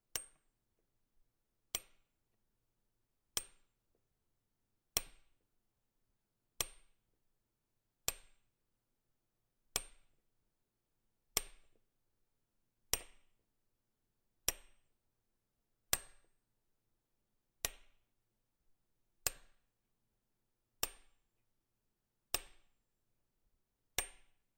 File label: Metal Hitting Metal Slow